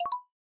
drumset, drums

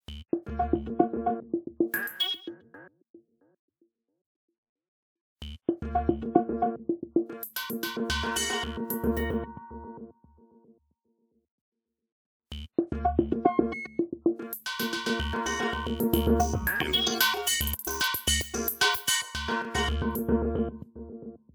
Progressive Abstract, ringtones; Windows Mobile

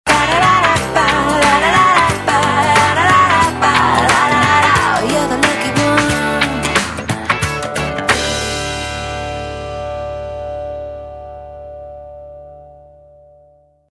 Chirp, Motorola W490

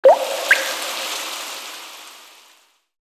HAPTIC LOCK WEATHER RAIN; Sharp AQUOS sense lite SH-M05; sharp audio

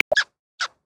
Parasitic Jaeger, Polar Region; Xiaomi Redmi-Note-8-Pro

